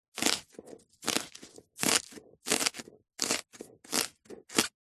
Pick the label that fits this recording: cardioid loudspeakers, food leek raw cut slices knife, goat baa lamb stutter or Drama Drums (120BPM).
food leek raw cut slices knife